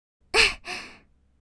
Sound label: Effort grunt anime girl vocalization